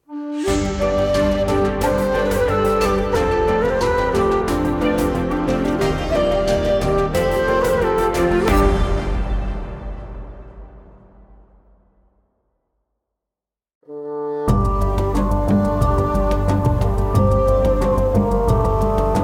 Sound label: Cave And Dlc Music; Beast Breaker